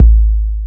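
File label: Miami bass drum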